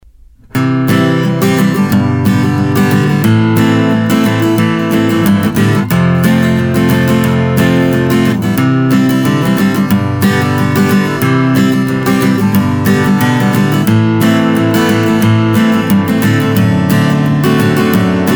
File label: strumming, guitar